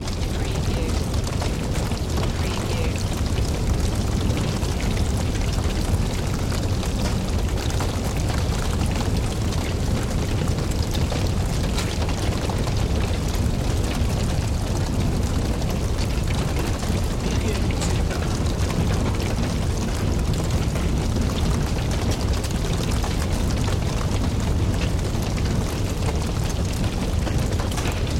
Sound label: Fire Wood Crackle